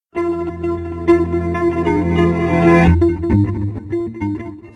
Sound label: intro music